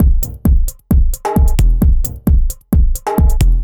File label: Complete Loop, Dance Beats